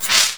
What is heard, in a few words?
SOUND EFFECT, Metal; AKAI S6000 CD-ROM - Volume 1